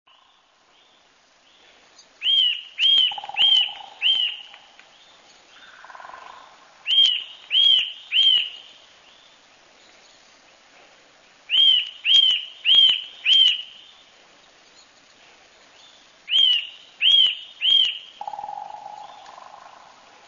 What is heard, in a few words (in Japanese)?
gojukara, birds